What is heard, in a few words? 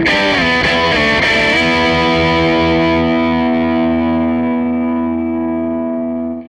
Gretsch Guitar Loop